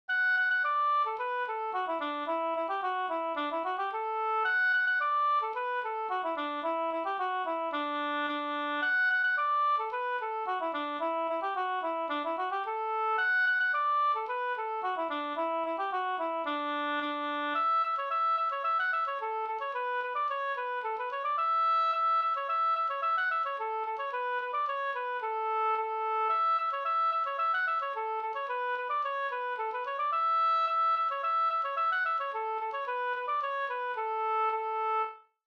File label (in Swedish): folkdans, Reel; Virginia